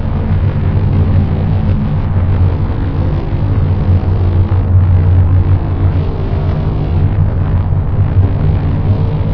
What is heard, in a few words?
noise, ambient atmosphere